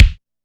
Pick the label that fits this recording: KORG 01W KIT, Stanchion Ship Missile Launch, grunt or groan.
KORG 01W KIT